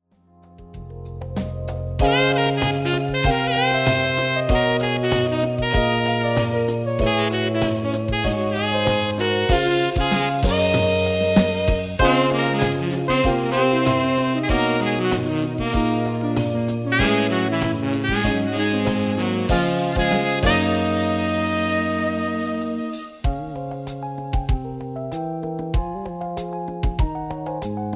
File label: sax